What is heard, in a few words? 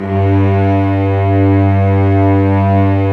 STR VCS, f Amb